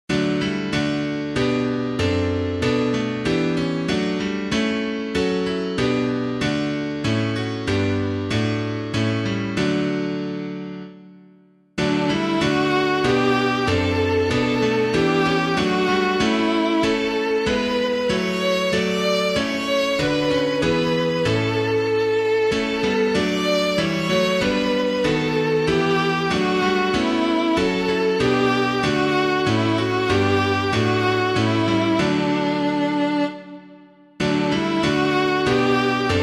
piano

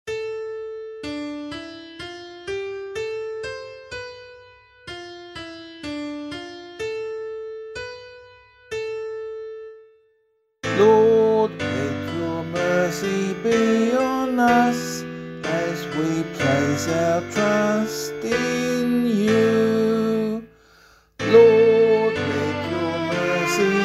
Psalm, vocal; 29th Sunday in Ordinary Time, Year B • vss. 4-5, 18-19, 20-22; LiturgyShare music with Grail stanza text

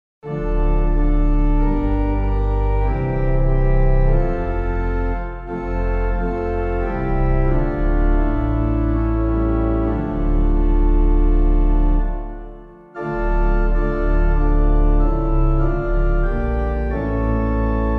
organ; From the Depths We Cry to Thee; McDougall; HEINLEIN; Clyde McLennan

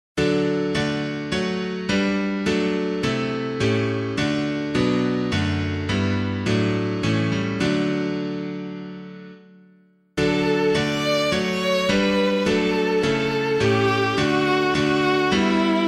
piano

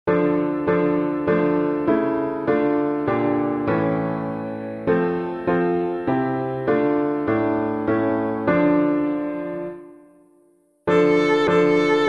piano, Hymns; From the Depths We Cry to Thee; McDougall; HEINLEIN

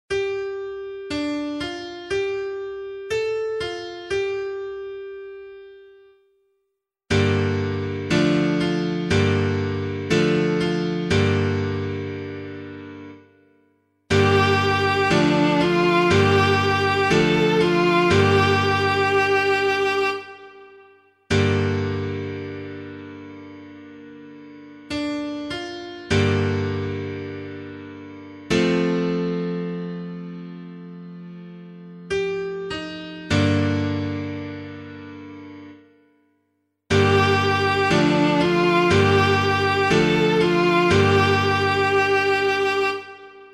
piano, Responsorial Psalm; Sing the Responsorial Psalms

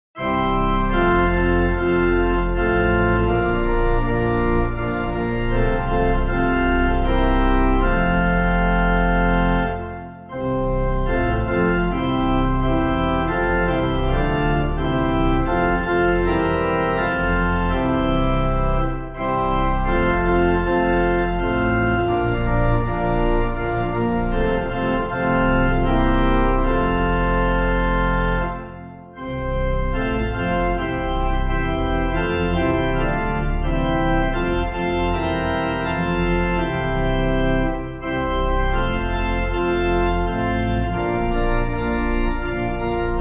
The Temple Rang with Golden Coins, organ, hymn

